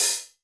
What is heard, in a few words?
LOOSE, 30S JAZZ; Northstar - New Gold VOL-1